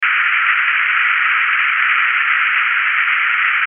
Static Hollow Filter, Static Feedback Front To Rear To Front; Production Element Imaging Element Accent Transition; Blastwave; Static Sound Effects